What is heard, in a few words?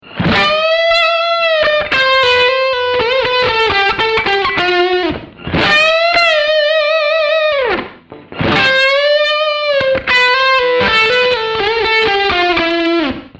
Full Gain